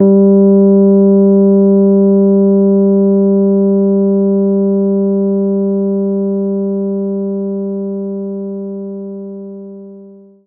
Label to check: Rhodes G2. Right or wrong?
Right